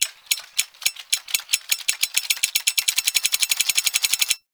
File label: Grenade Countdown; Orokin; Grineer; Weapons